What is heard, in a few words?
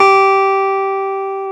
KEY X Hohner Clavinet; Club-50 - Foundations Roland; Clavinets